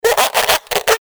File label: alien